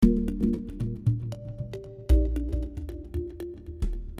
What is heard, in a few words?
Mallimban Ebm7 115